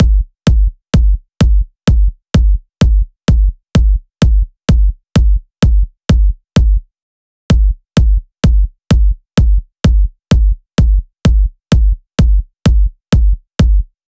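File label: Drum Loop 128BPM bass drum; VEC5; Drumloops; Multi Loops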